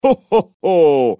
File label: ho ho ho; Santa